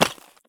sprint tile; Escape from Tarkov

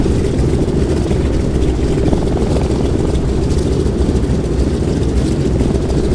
c46 rear, engines; War Thunder

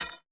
drop spring; Rust

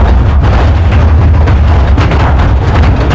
roughness heavy tank; World of Tanks Blitz; rough ht

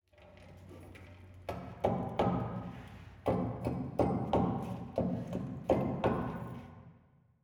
hangar layer

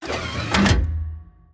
gun reload; World of Tanks

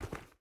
footstep rubber boots dirt walk; Rust

